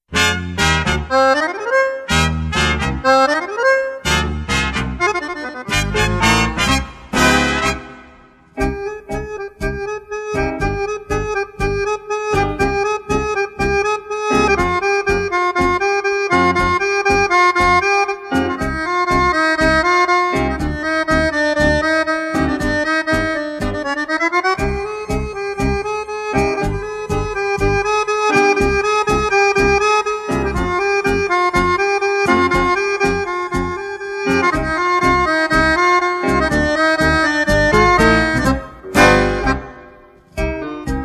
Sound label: accordion tangos